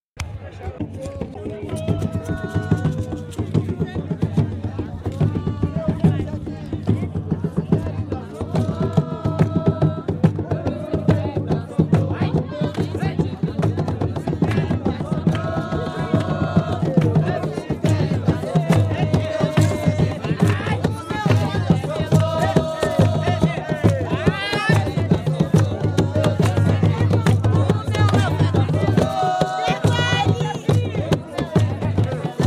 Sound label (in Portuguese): jongo de guaratingueta